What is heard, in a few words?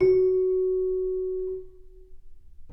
celesta; dsp2008 sound library